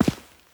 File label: Step Stone